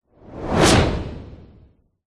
sound design whoosh transition swoosh med powerful